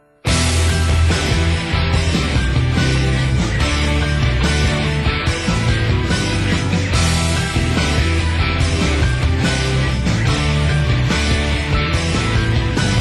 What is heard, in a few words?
Delicate Piano